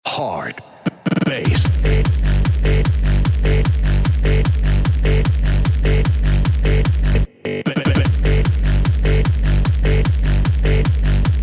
Hardbass

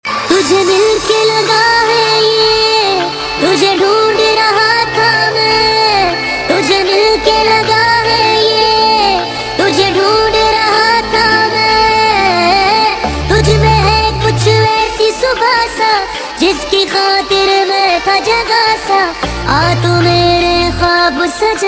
Chipmunks

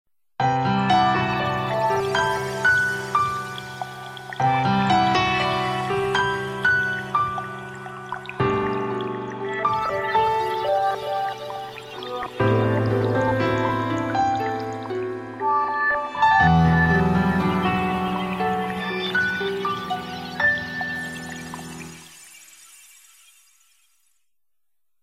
Relaxing Tone

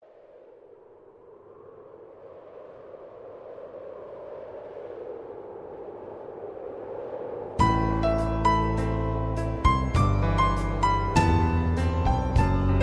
Sms Wind Of Piano

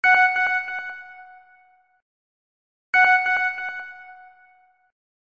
SmS discrete

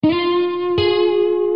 Riffing

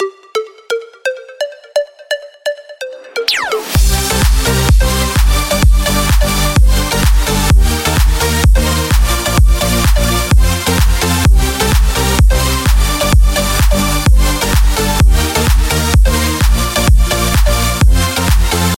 Happy Drop

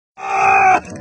yell

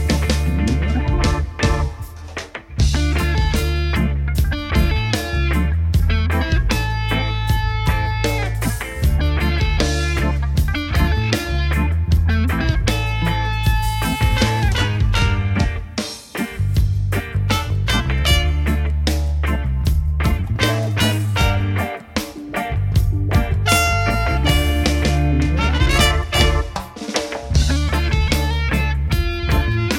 California Reggae

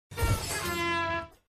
Rusty Horn

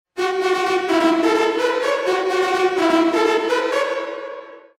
Electra Ring

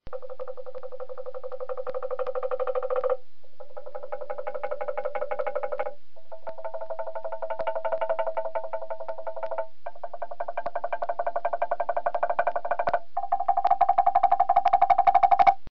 Korean Temple Blocks

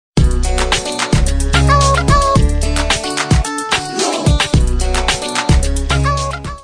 Ohoh Music Sms

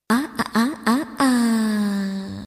Ah Ah Ah Ah Aaaaah

